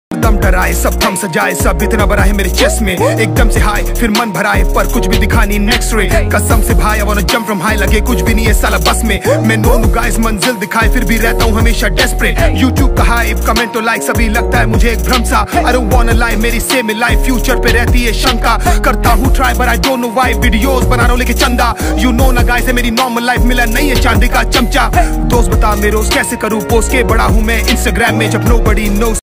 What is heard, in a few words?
hindi rap ringtone